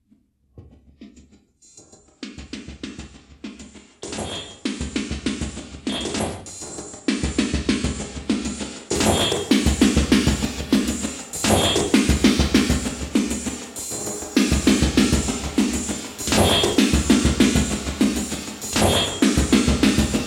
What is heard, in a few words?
percussion